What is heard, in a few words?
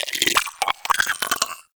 Arachnoid Vocal Grapple; Enemies; Vocals; Warframe; Corpus; Arachnoid Hunger